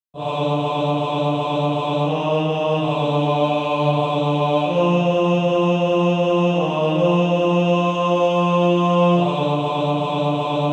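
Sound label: Male Choir, bass; Redeemed How I Love To Proclaim It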